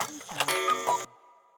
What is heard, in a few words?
cuckoo; BALLY; sfx; TWILIGHT ZONE; Pinsound; Twilight-Zone-Full-Reorchestration